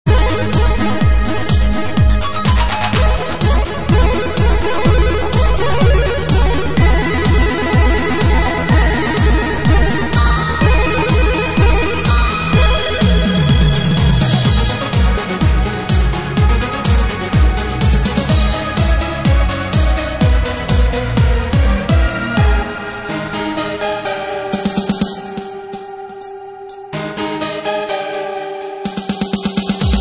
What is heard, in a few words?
Piano in the rain; Supremacy